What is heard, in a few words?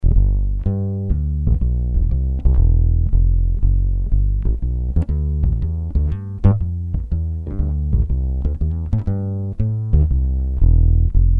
walking bass